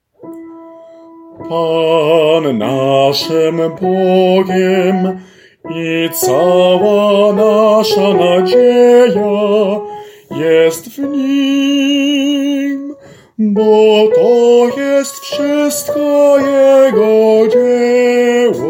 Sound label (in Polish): SOPRAN; Pan naszym Bogiem